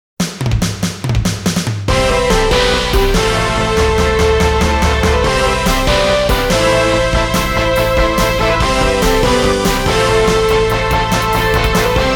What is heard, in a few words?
Land - Intense Fighting; Shin Sangokumusou; kyukyoku ongen